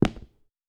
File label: Dress shoe